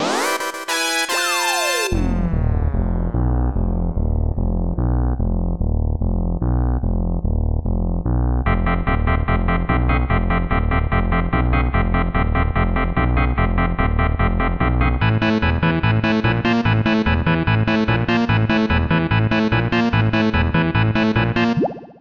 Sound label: Chill Stage Clear